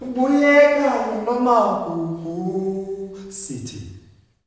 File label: UNA SOLO, MALE; Spectrasonics; Heart of Africa 2; S.MALEAMB